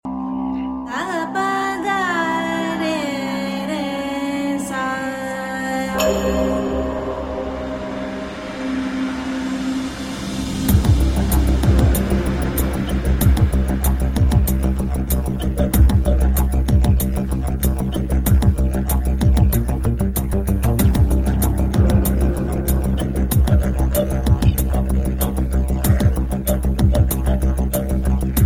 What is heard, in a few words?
arabian bass